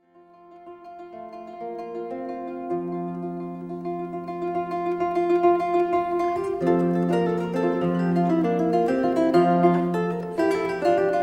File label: Sanz Canarios, Zither; Sanz Canarios Wasserburg